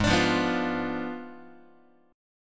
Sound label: G sharp Diminished 7th G sharp, Guitar; G#dim7 strummed; JGuitar